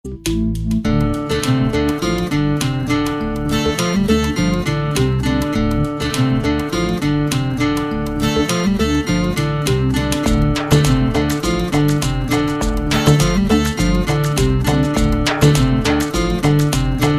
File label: fantastic guitar; alternative